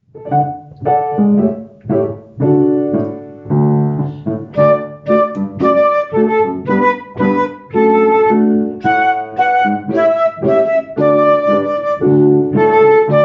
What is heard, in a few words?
Old MacDonald with Solo